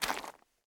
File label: zombie footstep mud; DeadFright